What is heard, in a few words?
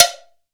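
COW BELL; GM PERC KIT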